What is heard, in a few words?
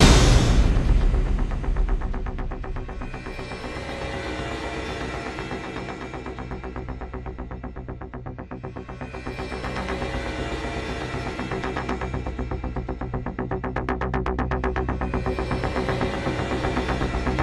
MATRIX OF LEADERSHIP SYNTH, soundtrack